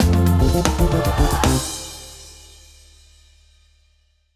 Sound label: music freespin intro